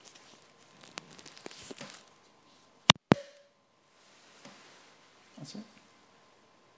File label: cork